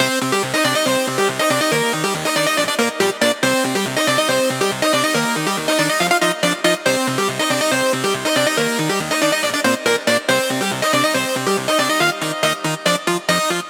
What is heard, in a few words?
140BPM C; Vengeance - Essential Clubsounds Vol.4